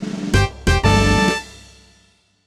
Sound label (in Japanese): ファンファーレ